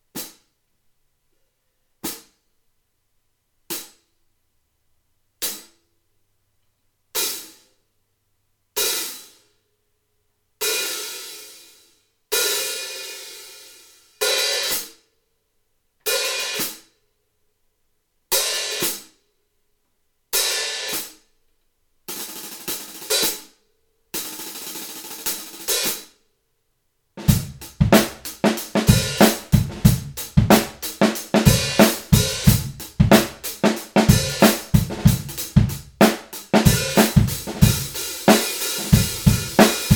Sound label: Hi hat 14; Taurus; Amedia